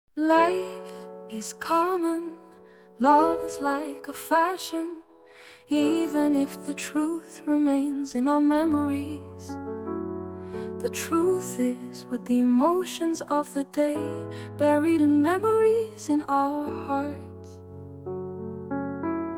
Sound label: F English Music